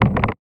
Percussive Hit, contact mic

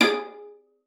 G#4, VIOLA FINGER; Xsample VOL-5

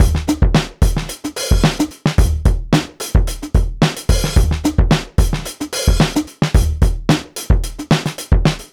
Drum Loop, Drum Loops

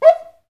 PRC XCUICA, Skin Drums

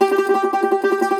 cumbus saz G, plucked strings; E-MU Producer Series Vol. 7 – Old World Instruments

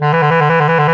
WND TRILL, Clarinet FX

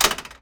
on off, Switch FX; Masterbits

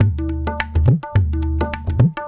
Tabla

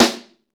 snare, Roland; Club-50 - Foundations Roland; Ext.Snare 2

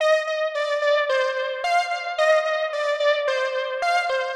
Synth 110BPM

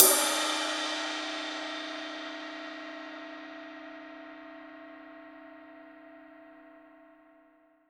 CYM, Real Drum Kit, FUNK KIT

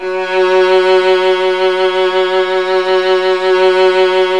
Virtuoso Strings

